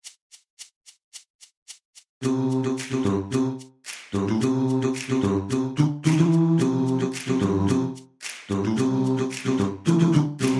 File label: Bass